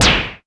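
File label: snare, ARP Odyssey Drums; Polyend; Simulacrum Sounds; 1 Shots; Synths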